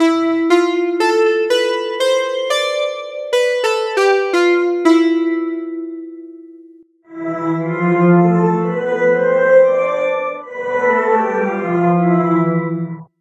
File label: dry and wet no delay; sosir